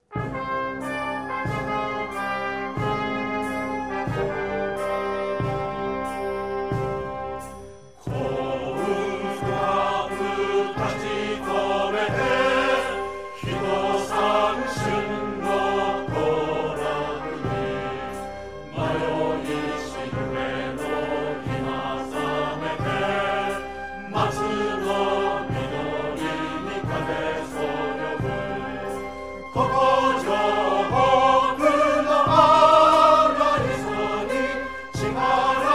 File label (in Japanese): ouenka